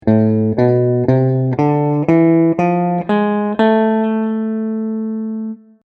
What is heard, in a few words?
harm minor scale